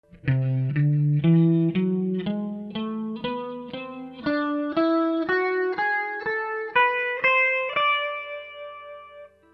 Ami melodicka, kytara

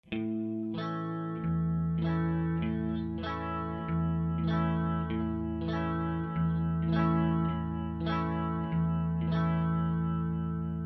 basovani, kytara

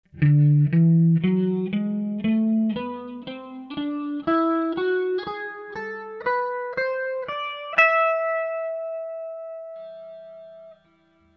Ami melodicka, kytara

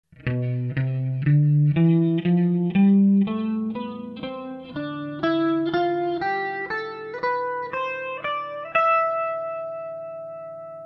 lokricka, kytara

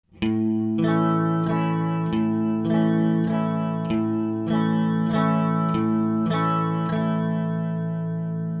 3 4 rytmus, kytara

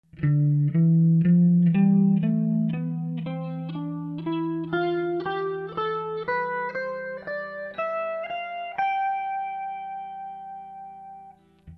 dorska, kytara

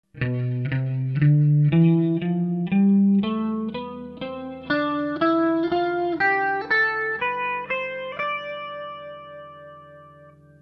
lokricka, kytara

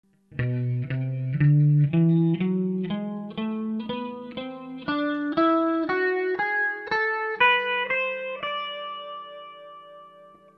Ami melodicka, kytara

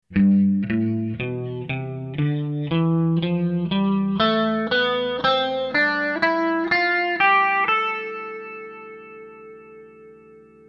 mixolydicka, kytara

